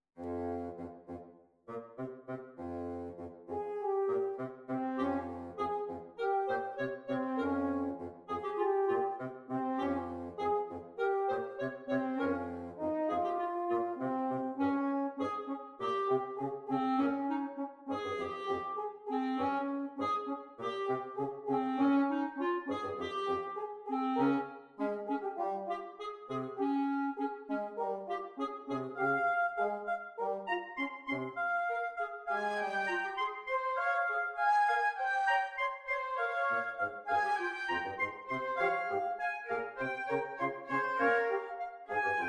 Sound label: small wind quintet